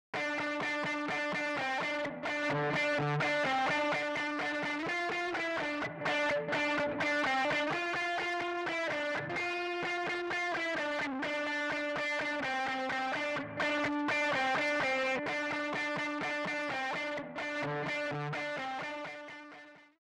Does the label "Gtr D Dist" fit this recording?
Yes